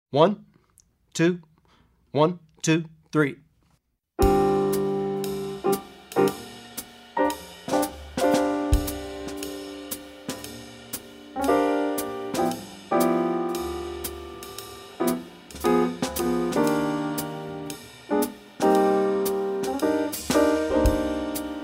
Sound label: Jazz, without bass soloist; Effective Etudes For Jazz Bass; JazzBand